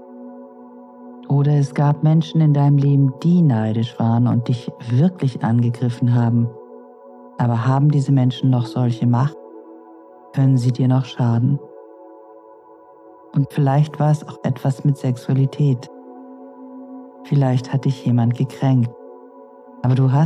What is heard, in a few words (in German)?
Musik; wenn trauma dick macht